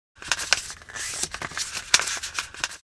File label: Paper handling folding